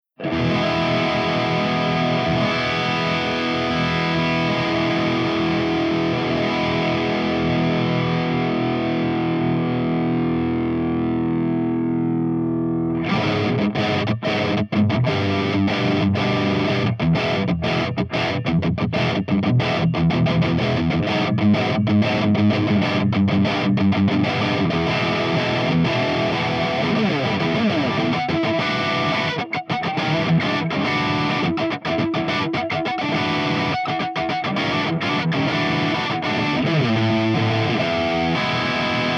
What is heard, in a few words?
ENGL CH4 HIGH GAIN V30; ENGL SP